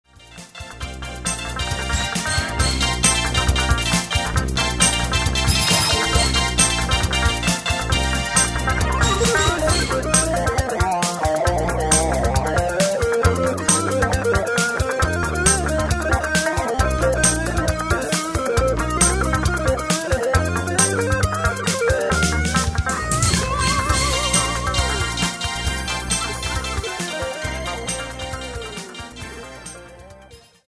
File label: arkansas lightening